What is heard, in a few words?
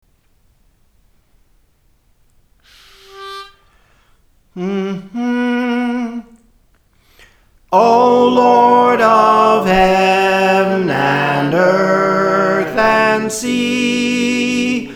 Soprano, Songs; Praise For The Lord Training